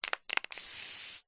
leisure can silly string shake spray

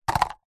household cups plastic drop onto others; zapsplat

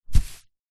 hat sombrero put on head

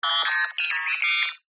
science fiction robot android beep chirp classic

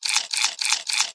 cartoon bite eat crunch x4

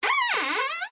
Wood Stress Creak Squeaks; DPA4060; pmsfx

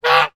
bird goose honk once; animals

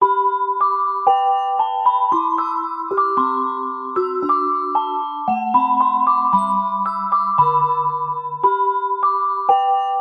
musical ice cream van musical chime; zapsplat

